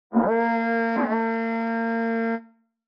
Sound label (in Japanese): Horagai